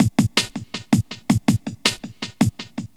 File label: Break, Loops; M8